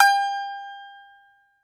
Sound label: BAGLAMA G0NR; E-MU Producer Series Vol. 7 – Old World Instruments